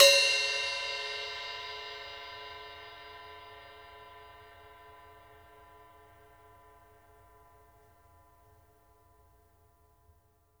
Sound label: STICK ON, HI HATS; Sampleheads; New York City Drumworks VOL-1